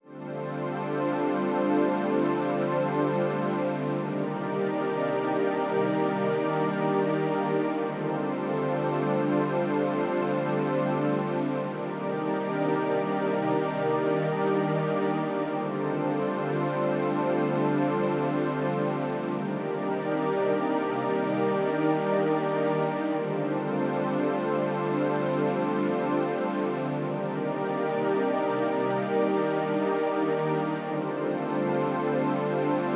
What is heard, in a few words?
125 BPM G Min Pad, Sunset; Melodies; Acid Dream; Cymatics; GHOST (Beta Pack)